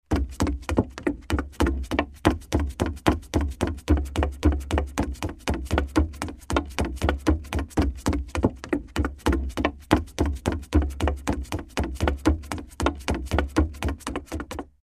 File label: Footsteps Hollow Woo